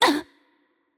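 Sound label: Korean hurt light; VO; ko KR; bloom minigame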